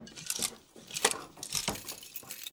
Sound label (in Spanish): TRASTEAR COSAS ARMARIO; yesterday origins gamerip 2016 switch ps4 pc ios macos